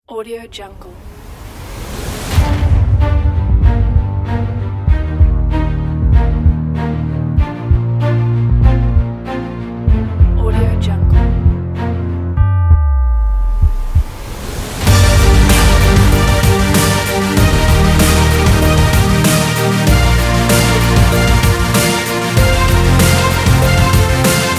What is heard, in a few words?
epic hip hop sports